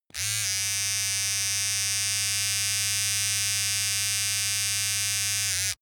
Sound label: razor small long